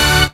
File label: Synths D; Vengeance Samples